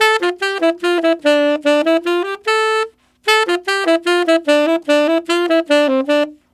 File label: Tenor Polka in D min, Tenor Sax Loops, Jazz; Sonic Foundry (Sony Creative Software); Jazz Solos and Sections